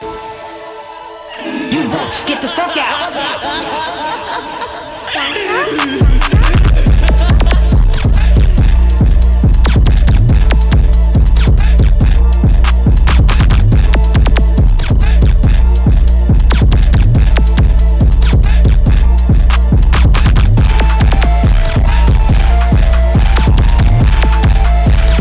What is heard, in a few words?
INSTRUMENTAL